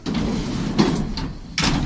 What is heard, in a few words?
fast close, Metro